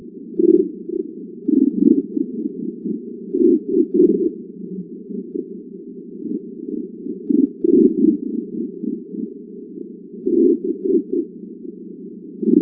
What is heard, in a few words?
Mantis Shrimp busy chorus pitch 2X